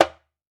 WALK DRUM, African Drums

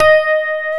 RV E. PIA

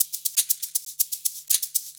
120 SHAKER; Best Service Advanced Media Trax Modern Composer

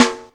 SD, dance drums hip

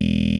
perc voice, Roland MC-909

